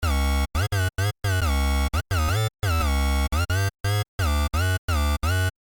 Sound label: Bassline; composed from 2 square waves and a triangular wave set at different frequencies and pan settings